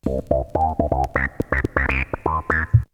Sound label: Melody loop; ZERO G - Datafile DISC 3